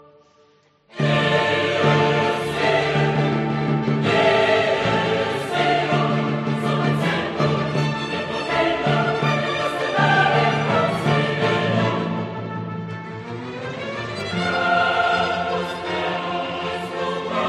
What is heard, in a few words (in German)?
Requiem d-moll, Dies Irae; Wolfgang Amadeus Mozart